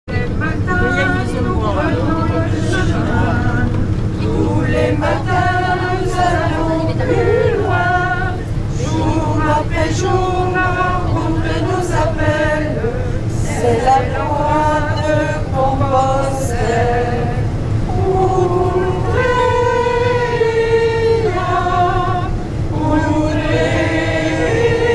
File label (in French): chant des pelerins